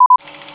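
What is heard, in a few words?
ui video record, Motorola ROKR EM35; ui sounds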